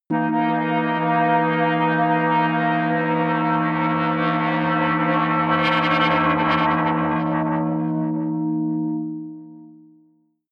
atmospheric feedback synthesizer long high, drones; musikelemente